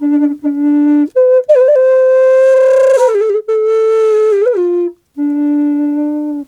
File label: D wild flute; Big Fish Audio - Didgeridoo and Other Primitive Instruments